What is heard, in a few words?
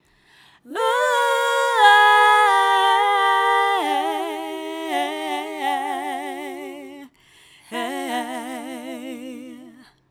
Female Vocal